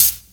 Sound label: HH, Dance Mega Drums; Best Service; HH-HIP